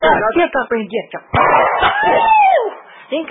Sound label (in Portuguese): rajada de 30